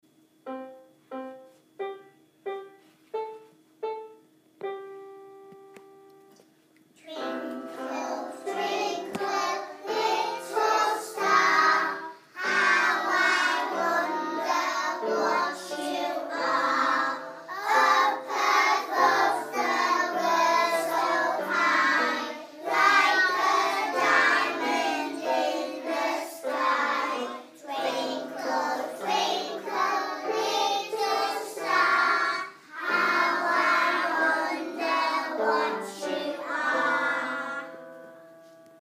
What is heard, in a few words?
Twinkle Twinkle Little Star; nursery rhyme time